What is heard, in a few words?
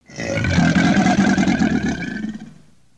Pet Crocodile Howl; creatures pets